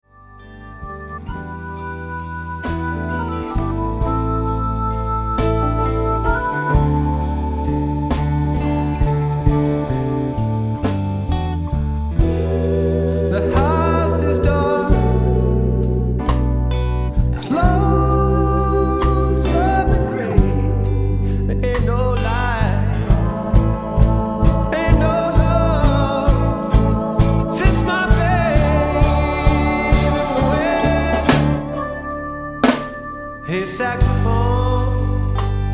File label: hey saxophone